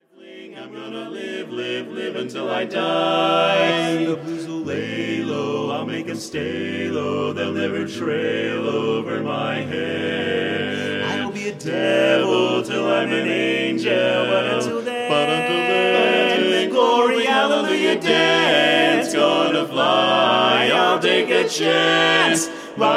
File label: Barbershop Quartet